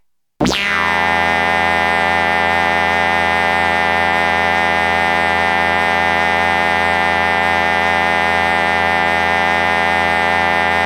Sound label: breaks; Super Duck Breaks